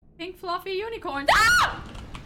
uniaahhhhhhhhh

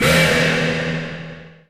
jumpscare sound; hybrid lockjaw

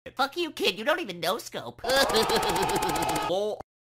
laugh hue laugh

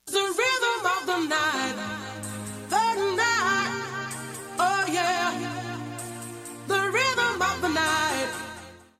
rhythm

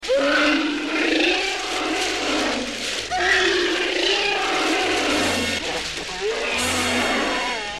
roar

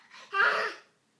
aaaah

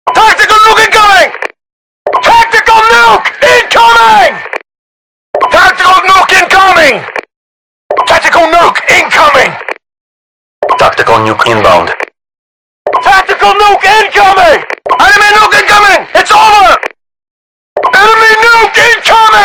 big nuke incoming

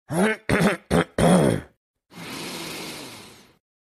breath in

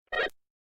radio sound; combine

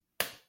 slap

